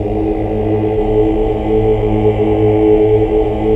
HAL OOH OOOH Oct

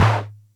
Music Percussion Computer Tom, Drum Machines

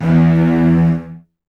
Virtuoso Strings, TRM FX

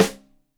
Rim Shot Stereo, drums; Deeply Sampled Drums

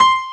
PIANO C5; St.Piano&Strings

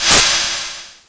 brush roll s, Jazz Brush

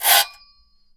RECO MUTE, BRAZIL

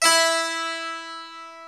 HARPSICHORD, Keyboards; E-MU Producer Series Vol. 2 – More Studio Essentials

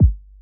Kick Body

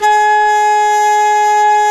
WND FLT MT, Flute & Mute

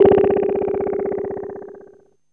ANALOG BELL; Zero-G; Ambient 1

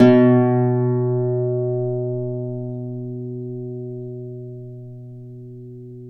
Celtic Harp, Plucked Strings

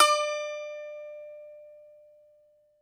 BAGLAMA, Plucked Strings

